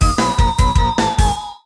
Losing a level; Crumbling Cavern

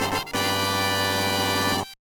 Win; MPA; Mini-Game Attack

